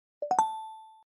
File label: notification